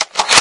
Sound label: oicw reload; svencoop; hl2